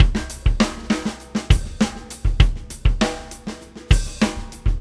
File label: Prog Rock 99bpm, Drums; ACID MUSIC